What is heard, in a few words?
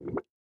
Sex Noises; Hilzartov; Fairy Size Doesn't Matter